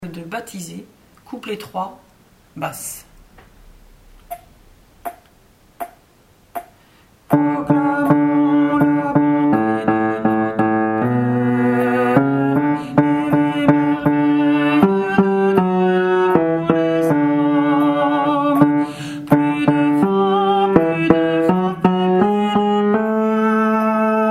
COUPLET BASSE; Peuple de baptisés

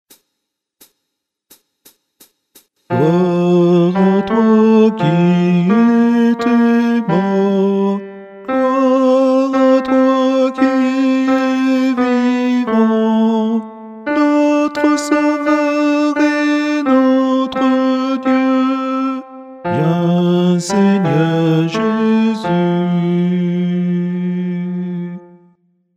Messe de Saint Jean Anamnese Guide Chant Tenor

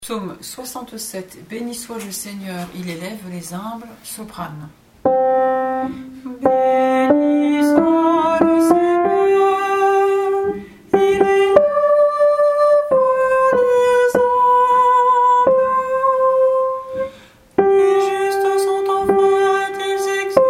PSAUME SOPRANE; 67 FONSALAS Béni soit le Seigneur il élève les humbles

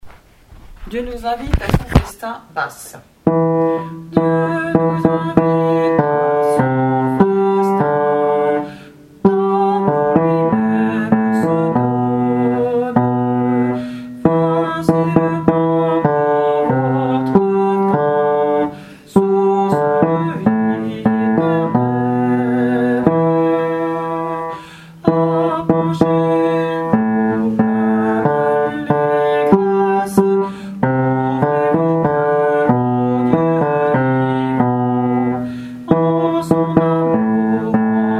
Dieu nous invite à son festin Basse

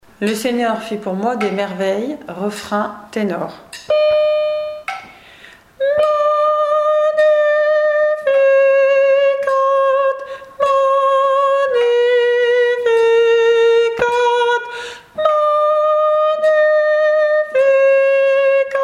Le Seigneur fit pour moi des merveilles TENOR

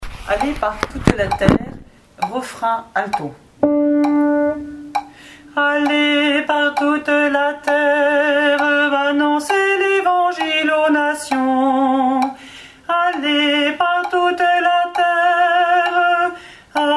REFRAIN ALTO; Allez par toute la terre

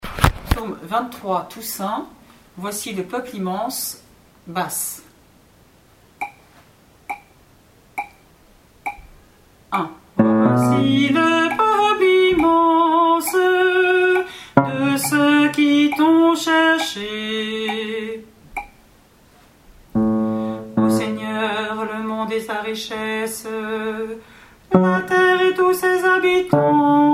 PSAUME 23 Voici le peuple immense de ceux qui t'ont cherché BASSE; TOUSSAINT

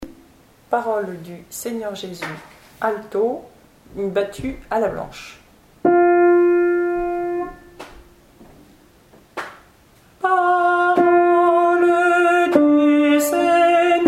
ALTO; Parole su Seigneur Jésus

